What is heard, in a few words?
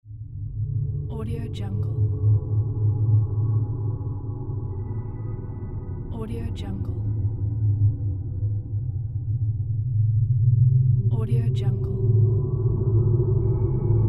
horror ambience